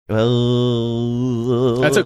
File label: making funny sound